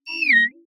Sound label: Small robot talking style beeping